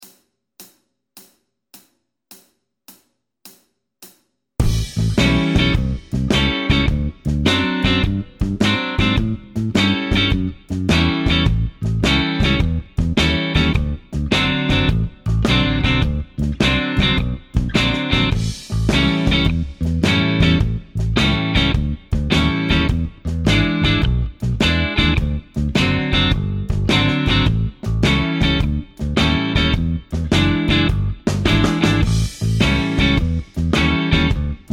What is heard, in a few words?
jamtrack, blues guitar